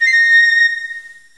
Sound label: pipe